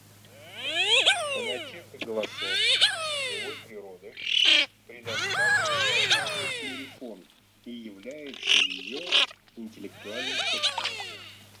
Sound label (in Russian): Красноголовый нырок Moriglione; plurifon